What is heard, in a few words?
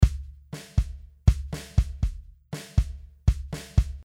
Beat